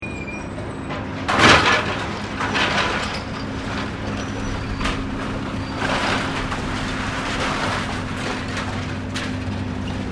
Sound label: Industrial Scrap Metal Loading Move, Scrape metal being loaded onboard ship; Machinery; Machines